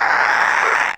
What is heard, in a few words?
demon gurgle loop; nh demon